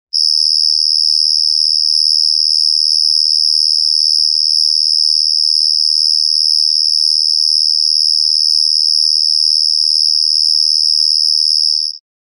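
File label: mole cricket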